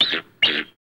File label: Bald Eagle Honks